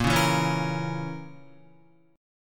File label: A sharp Diminished Guitar, chord; strummed; JGuitar